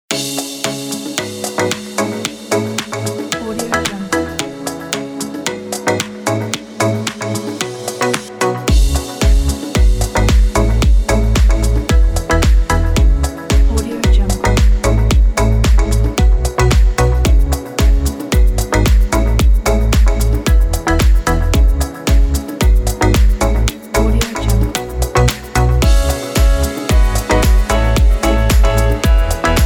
summer tropical pack

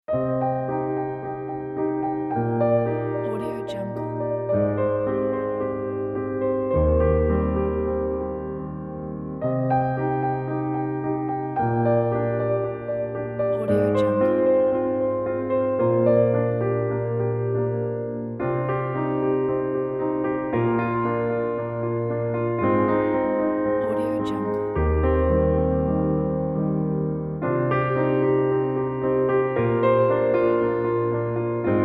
soulful piano; magicfaders

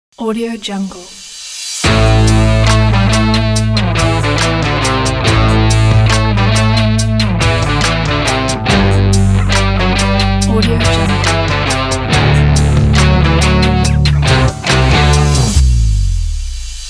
stylish rock with blues vibes

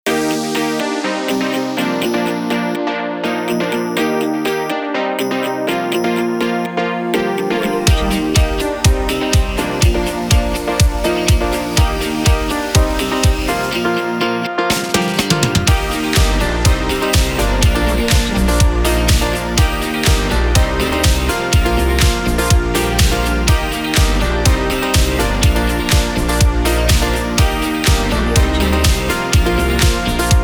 uplifting guitar pop

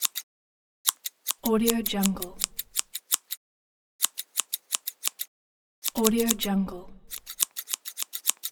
scissors clicking

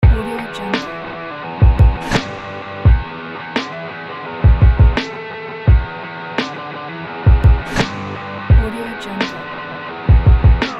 hip hop rock

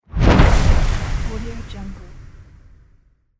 trailer explosion

